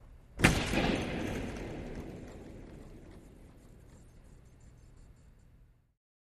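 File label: Hockey Body Hit Reverb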